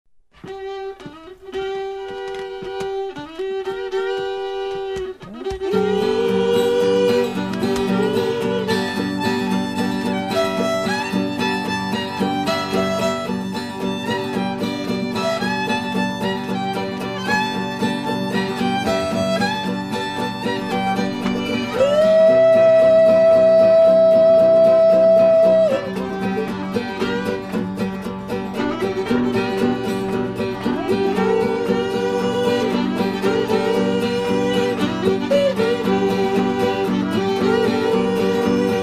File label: Indian War Whoop Instrumental